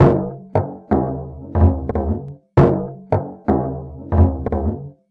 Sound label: Mooli Djembe, Acoustic Beats; Sonic Foundry (Sony Creative Software); Myths of Technology